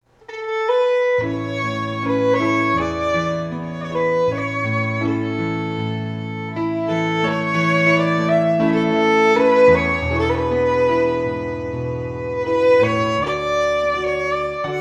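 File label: Scottish Fiddling; 2019 Book o Tunes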